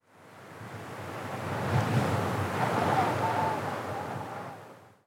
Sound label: wind gust